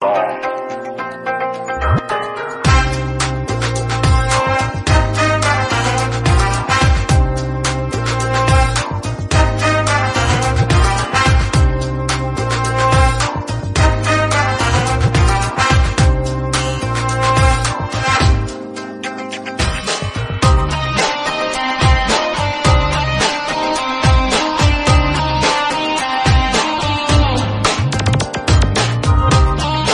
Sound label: Instrumental Ringtone; Bhool Bhulaiyaa